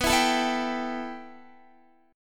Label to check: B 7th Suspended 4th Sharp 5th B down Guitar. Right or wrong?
Right